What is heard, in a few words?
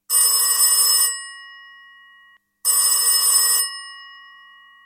phone ring